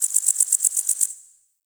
Cricket; Warframe; The New War; Ambience; Zariman

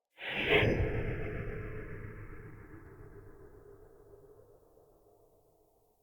Soul Plane random, ambience

